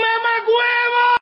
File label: draw crowbar